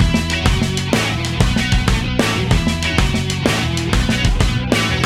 ROCK TONE, ringtones; Google; NewWave Labs

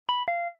Video Record Stop; Asus Padfone-2

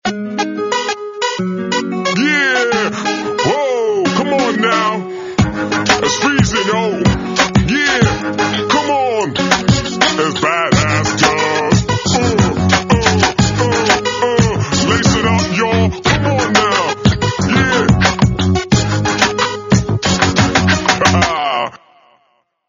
Hip hop guy

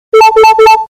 Cuckoo

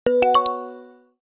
Rise, notifications; Huawei HarmonyOS 2.0

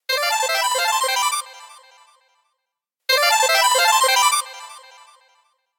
Treasure Chest, alarms; Google; Pixel-4; Retro Riffs

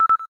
cam timer last; LG; V31 isai VL

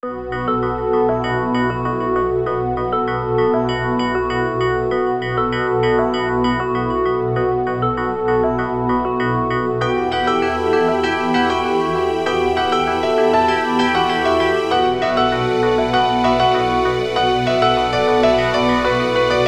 Rain Alarm; Sony Ericsson; PlusFourSix; Twiggy; Lars Edvall

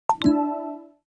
presence off

